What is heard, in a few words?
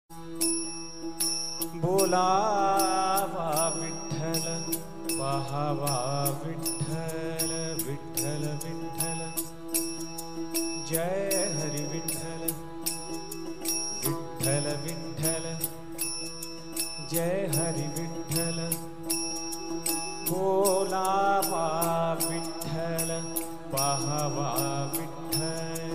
abhang, marathi ringtone; vitthal